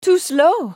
spell cast effort; Fiora

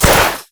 basicattack; LoL; graves skin05

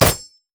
crit attack; LoL; akali

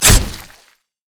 takedown attack; nidalee base

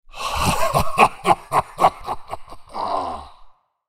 laugh; Aatrox

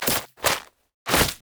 emote death; quinn

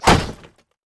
wolfman vs wood; LoL; warwick

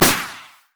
microrockets; LoL; heimerdinger

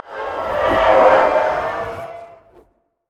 passive dead souls; LoL; thresh

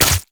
basic attacks; Zyra

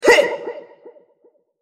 spell cast effort; RumbleSkin03

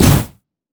turret; LoL; heimerdinger

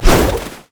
snowday basicattack; LoL; ziggs snowday

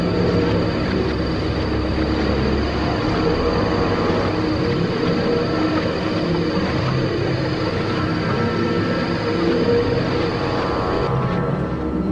zombie loop; LoL; yorick

